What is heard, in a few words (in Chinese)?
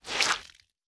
海绵吸水声